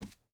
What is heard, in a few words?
footstep burlap wood walk; Rust